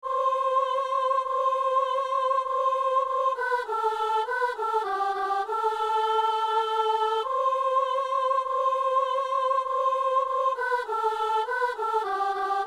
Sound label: soprano; Messe de l'Ermitage - Sanctus